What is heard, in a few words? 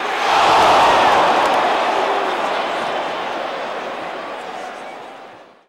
Baseball Crowd Reaction; SFX Kit; Crowds